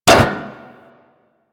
Ship Hit Effects

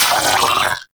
Arachnoid Vocal Pain

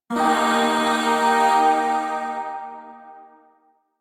Shawzin Pent Min Chord H; Music; Chords; Warframe; Zariman Void Shawzin; DE

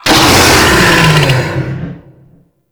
Infested Bone Blade Vocal; Warframe

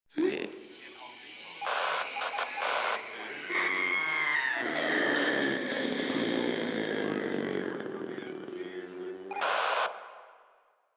Proto Infested Runner Idle; Warframe

